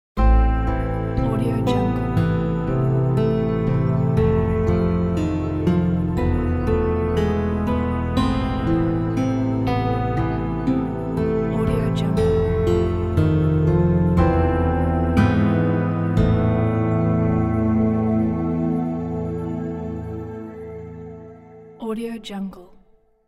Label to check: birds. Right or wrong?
Wrong